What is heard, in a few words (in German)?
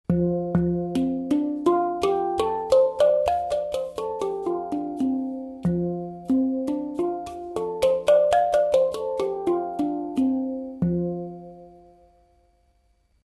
Pentatonisch F, hang